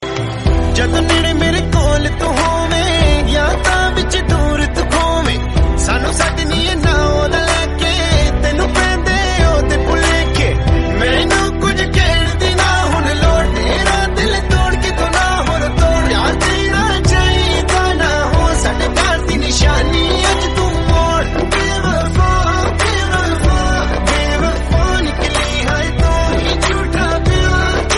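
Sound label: soft sad music tune ringtone, bollywood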